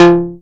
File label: stab buzz F, Noise; stabs